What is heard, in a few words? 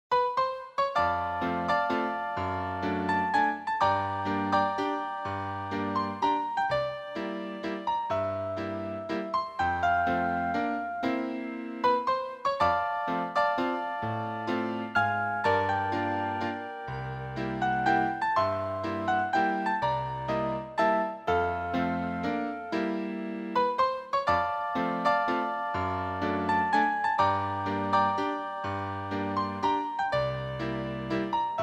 tea time waltz - syncopated